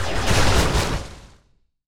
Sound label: cut